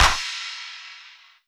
Claps, Elektron MachineDrum SPS1 MKII

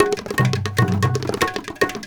India, Heart of Asia

